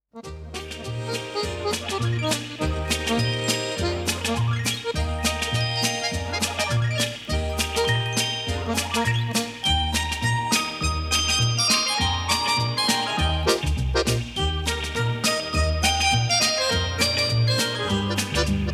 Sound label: Pianino